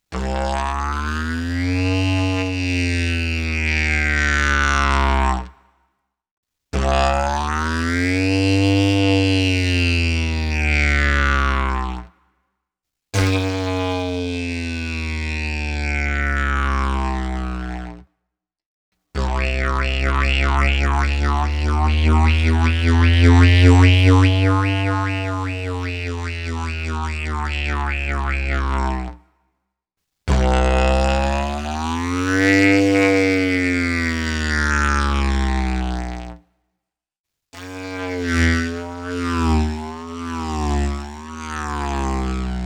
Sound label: Didgeridoo And Overtone; Good Stuff Audio